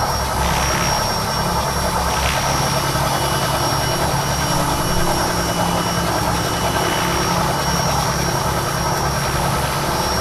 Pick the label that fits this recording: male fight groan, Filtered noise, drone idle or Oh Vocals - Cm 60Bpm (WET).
drone idle